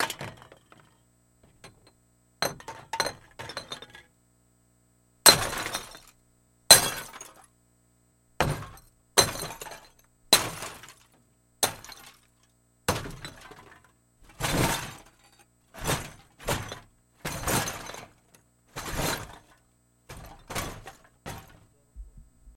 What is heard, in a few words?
Throw Trash Plastic